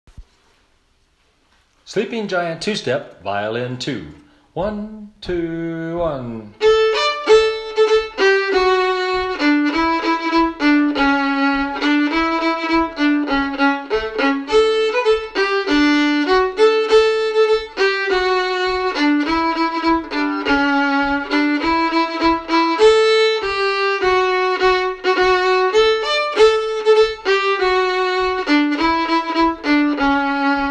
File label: violin two